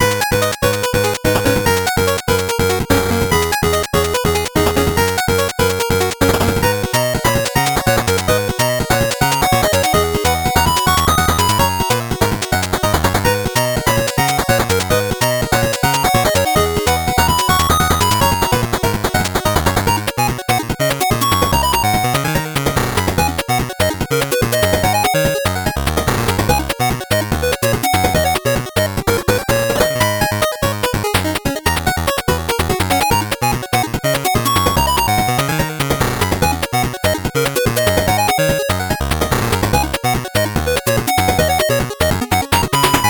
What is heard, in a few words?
8bit bgm fast